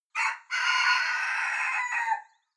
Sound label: cock call hoarse distant